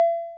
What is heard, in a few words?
bleep, Korg Electribe ER-1; Kit 8